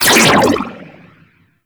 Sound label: Fire, Weapons; Warframe; Tenno; Vectis Prime